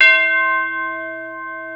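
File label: TUBULAR BELL; East West; Global Sounds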